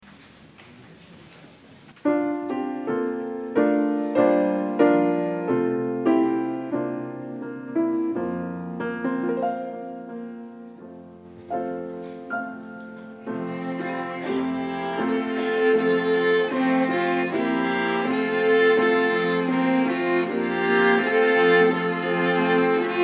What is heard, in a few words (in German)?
Orchester